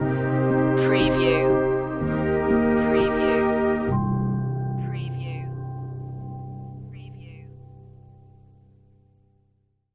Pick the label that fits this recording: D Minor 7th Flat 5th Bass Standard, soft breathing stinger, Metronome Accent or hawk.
soft breathing stinger